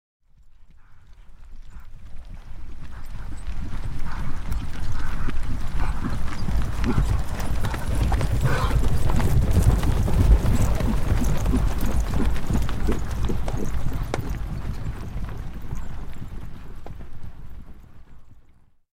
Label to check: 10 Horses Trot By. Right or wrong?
Right